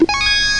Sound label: gem sparkle; Treasure Galaxy; Learning Company